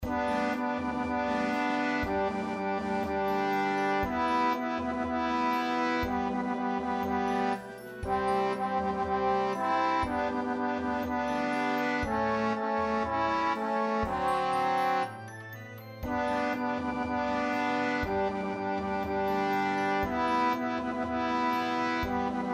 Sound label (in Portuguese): trombone bb